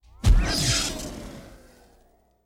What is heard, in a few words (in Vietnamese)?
Am thanh phep thuat bien mat